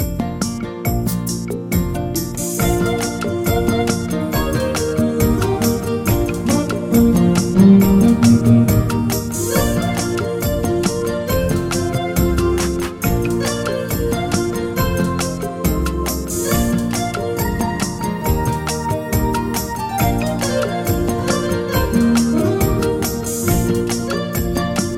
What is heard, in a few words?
Bossa Nova